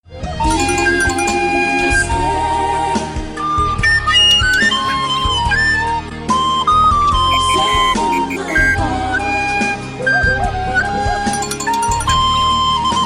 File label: Flute